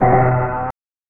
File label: Boing; Oscar; SNES